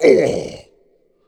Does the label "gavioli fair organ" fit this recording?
No